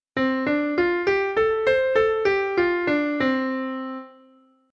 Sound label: C Major Pentatonic 1 2 3 5 6